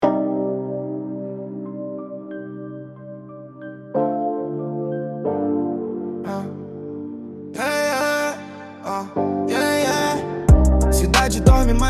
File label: chill trap session